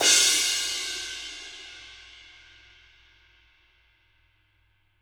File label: crashes; Living Drums; JZ CRASHES; Sampleheads; Peter Erskine